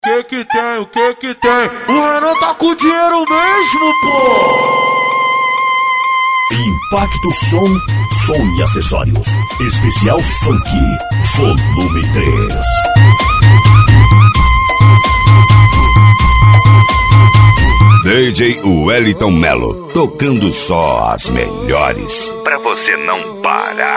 funk bass; impacto som

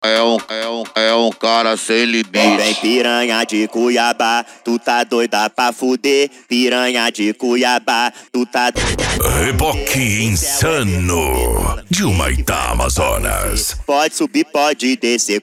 noia dance e funk mega bass

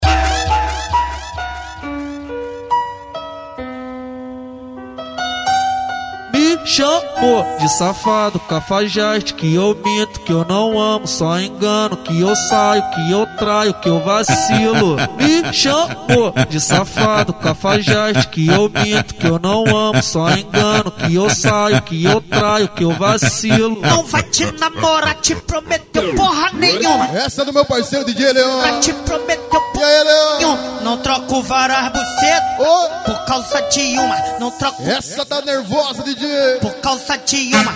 funk ritmado; especial cuiaba

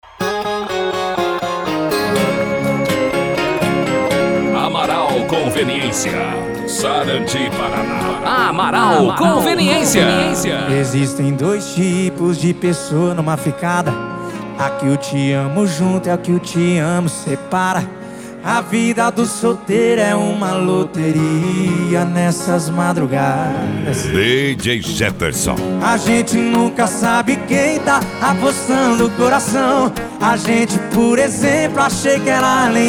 funk e sertanejo; amaral conveniencia; sarandi pr

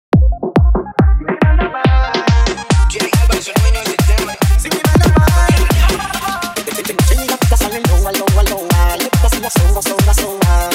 reggaeton

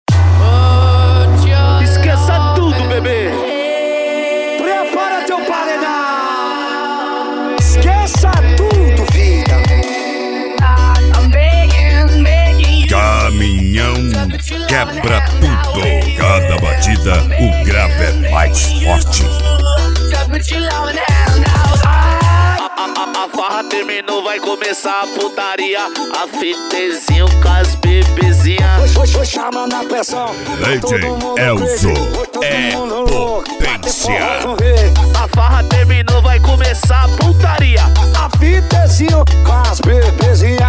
forró com rave; cd caminhao quebra tudo 2022

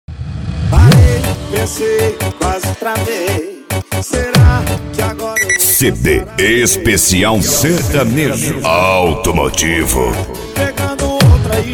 sertanejo automotivo

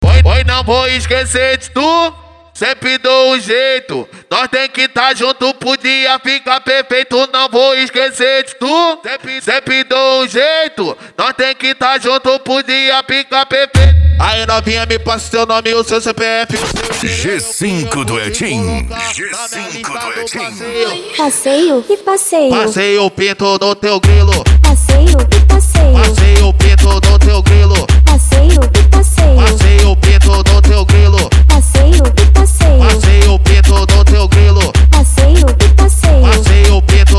mega funk e funk mega bass

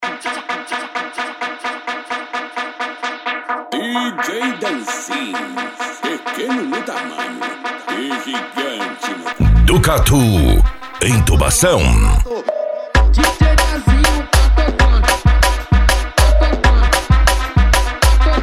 funk agressivo

